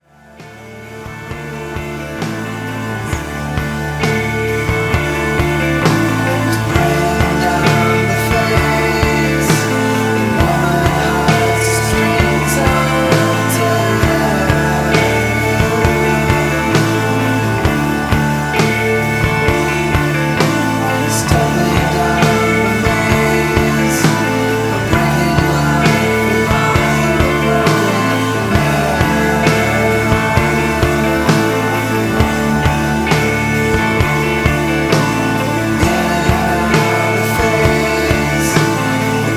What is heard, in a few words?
strings